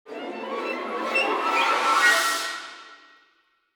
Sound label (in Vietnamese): Âm thanh nguy hiểm trong phim